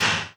highlight misc, ui menu

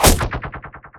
glaives impact; weapons; dota; silencer

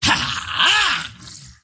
toy pug attack emote; dota; pudge

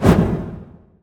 preattack; dota; ember spirit

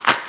impact; dota; marci

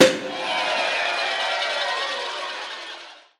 champagne celebration; dota; soundboard

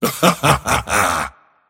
mars laugh; dota

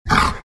grunt, greevil; npc; courier

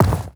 common equip; inventory

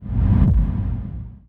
song cancel; weapons; dota; naga siren

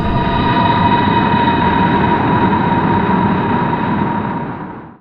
spirit siphon target; death prophet

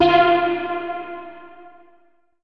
crystal; weapons; dota; meepo

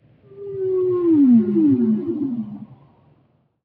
cetaceans; ambient soundscapes; dota

